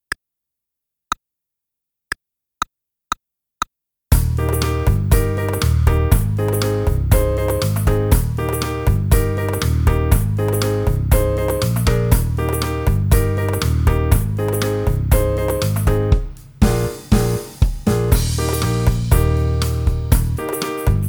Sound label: Playalong, trumpet; trumpet fun vol 1